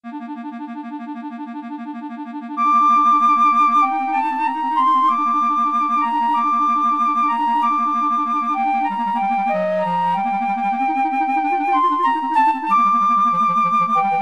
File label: scene finale, flute clarinet duet